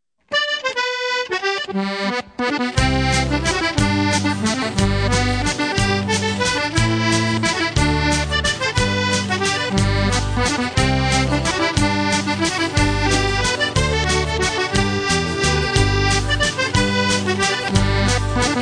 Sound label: Old Fashion Waltz